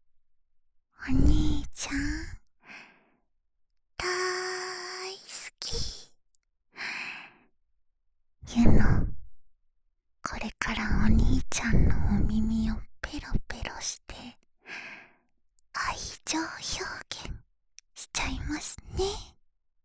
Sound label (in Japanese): 耳舐め愛情表現ASMR(セリフあり版)